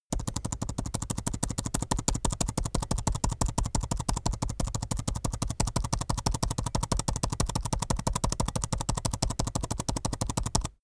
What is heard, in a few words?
keyboard laptop backspace sequence fast; Computer